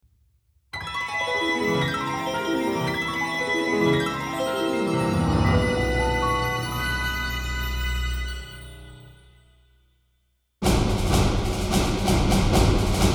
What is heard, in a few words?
Thunder Drum; the legend of zelda links awakening orchestral arrangement 2015; 8th Instrument of the Sirens